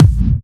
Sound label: Distortion Kicks, Bassdrums